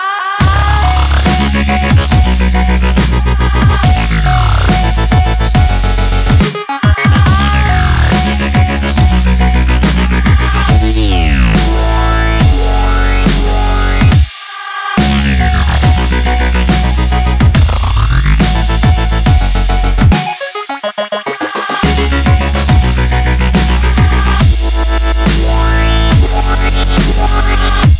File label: dubstep remix